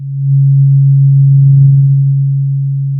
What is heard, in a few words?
Sound of a cruise ship horn